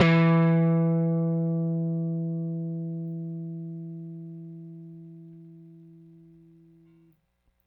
Rickenbacker Bass; Airwindows

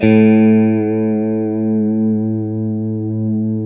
A JC120; Spectrasonics; Hans Zimmer Guitars VOL-1; JC-STRAT MF

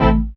SYN, dance synth

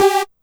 Synth Hit G

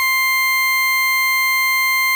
FARFISA ORGAN, Vintage Timetraveler

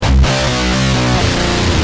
G Heavy, Guitar; Industrial

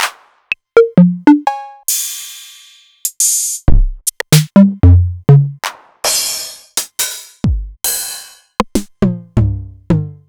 Novation Drumstation; DrumstationV1

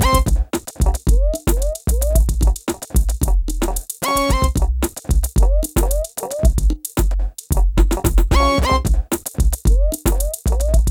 BEAT, Beats 105-150bpm

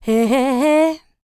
LICKS, Vocal R&B Dance; LT DANCE

